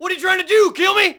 yell; Matt Hoffman's Pro BMX 2; XBOX

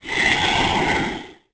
moan

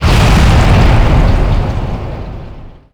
tikiboom; hawaii

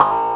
denied; WhiteOut

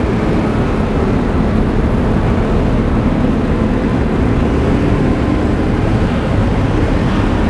street ext; ambience; narshaddaa

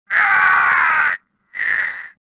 death, swamp

